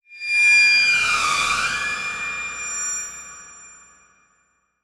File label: Title Transition Metallic; Production Element